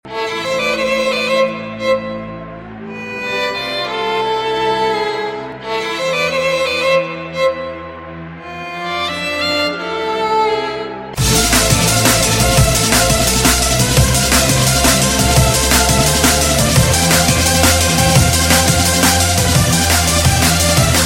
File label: skripka s drum n bass